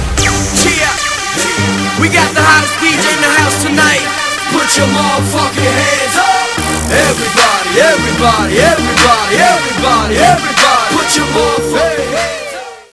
human; zombie plague

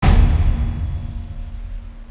menu popup; DeathRunVip

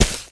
grass, Players

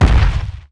footstep, tank; umbrella swarm

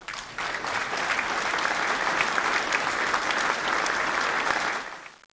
win simple

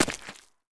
mud, Players

